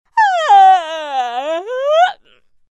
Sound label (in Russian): Starushka zevaet